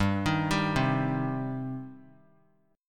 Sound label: G Suspended 4th Sharp 5th G, down; Gsus4#5 arpeggiated; JGuitar